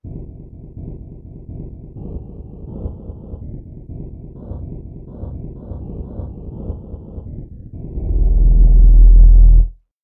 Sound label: shakuhachi bass